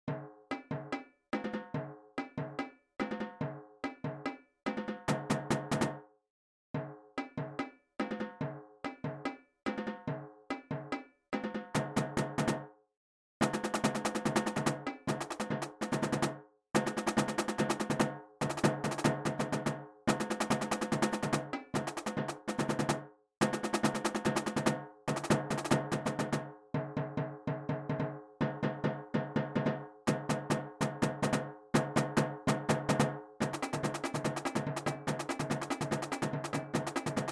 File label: swinging, drums